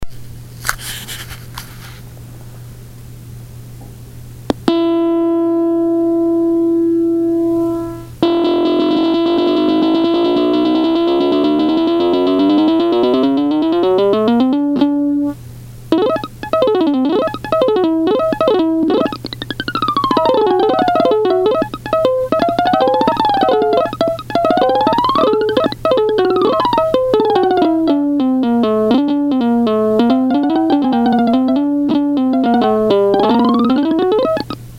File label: Pluck